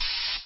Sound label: cutter; Machines